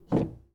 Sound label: Foosball Goalie Rotate